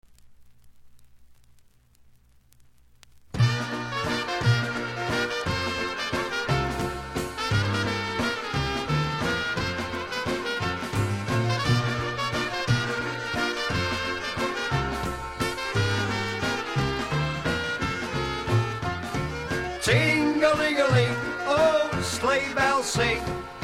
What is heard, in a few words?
sleigh bells waltz